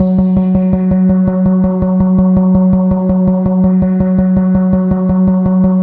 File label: memory repeats pulse; REMIXABLE COLLECTIONS